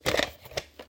VHS Handling; SoundsCrate

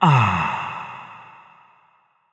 contented refreshed sigh

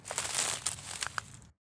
stepping on brush; soundscrate

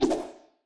anime whoosh twice; SoundsCrate

